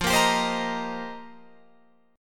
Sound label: F 7th Suspended 2nd Suspended 4th F Guitar Open F; F7sus2sus4; strummed